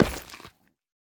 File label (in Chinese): 下界矿石踩踏声